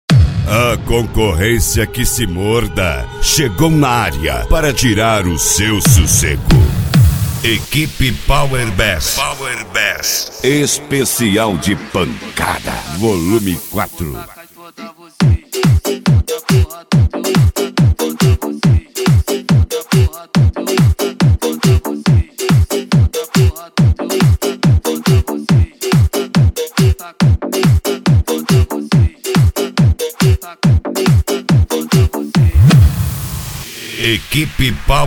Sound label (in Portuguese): pancada; equipe power bass